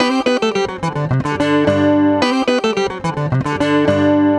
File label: Ac 12 Str Gtr 109bpm G, Twelve String Guitar, African Guitars; Quazibeat; African Secret